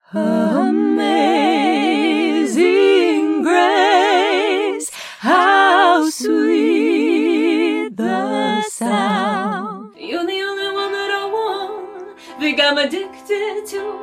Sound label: Singing Raw Vocals